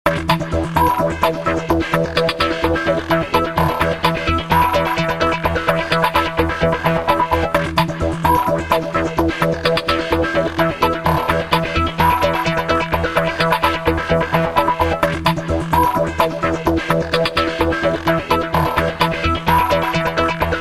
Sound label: Victory Theme; Impact Asteroid Combat; Windows gamerip 2006; Beginner and Easy Win